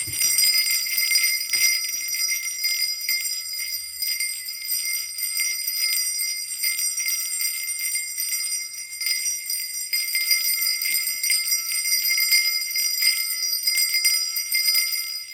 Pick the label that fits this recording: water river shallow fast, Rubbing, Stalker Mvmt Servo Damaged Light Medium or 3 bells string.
3 bells string